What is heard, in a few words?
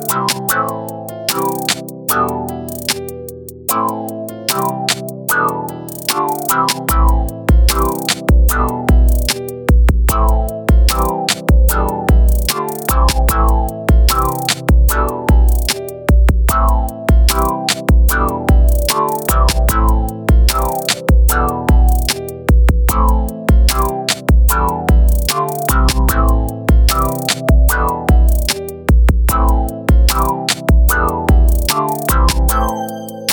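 guitar sample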